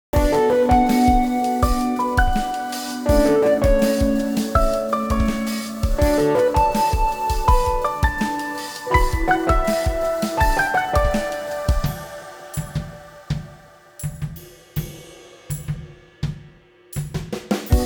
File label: Cheer Up